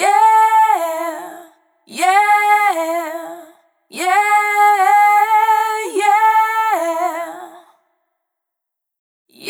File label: Multi-Voice Loops, Vocal Samples; Duality B; Sonic Foundry (Sony Creative Software) - Pocket Diva World Class Vocal Samples